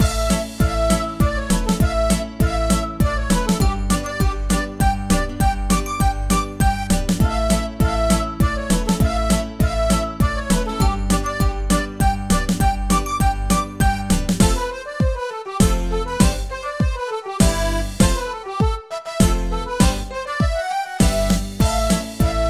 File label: Duck's call "quack! quack! quack!"; kono oozora ni tsubasa wo hirogete full complete soundtrack all skies 2017